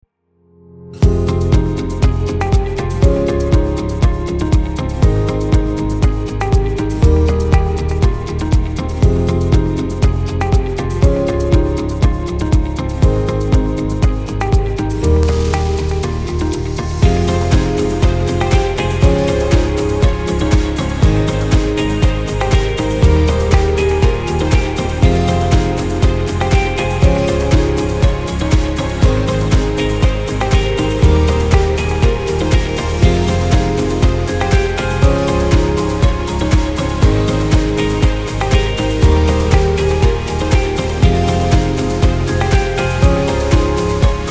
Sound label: Upbeat Background